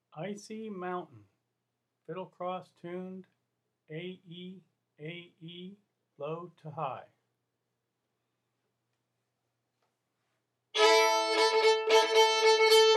fiddle; festival tunes

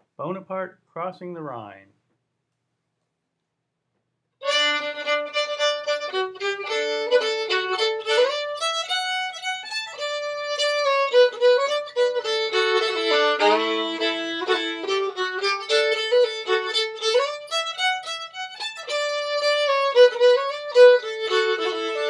fiddle; festival tunes

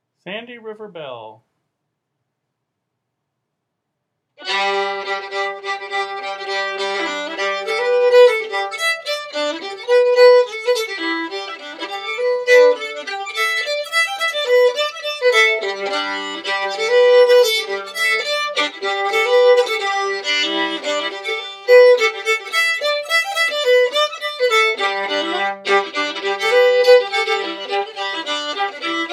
fiddle; festivaltunes

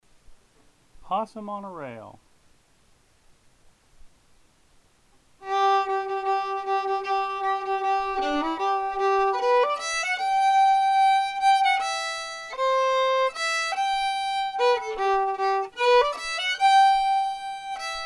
fiddle; festival tunes

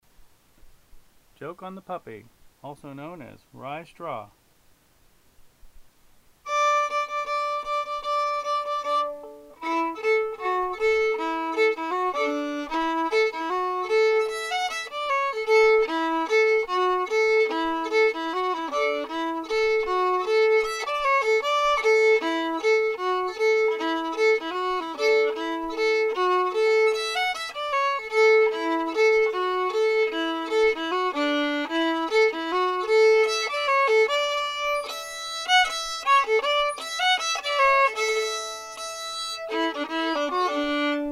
fiddle; festival tunes

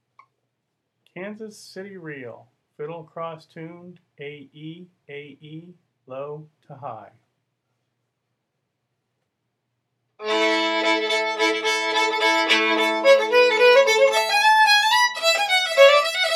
Reel, fiddle; festival tunes

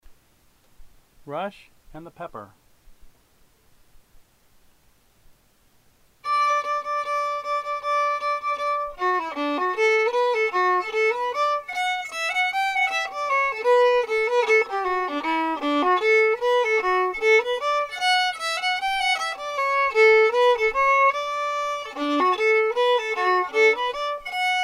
fiddle; festival tunes

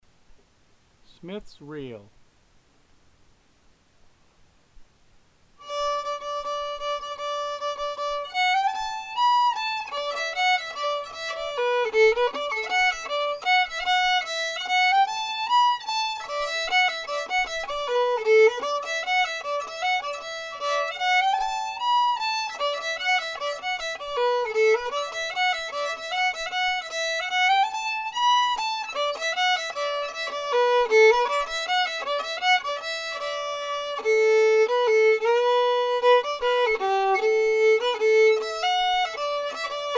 Reel, fiddle; festival tunes

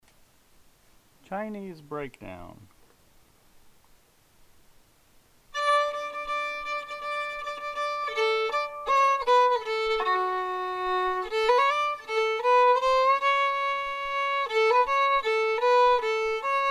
fiddle; festival tunes

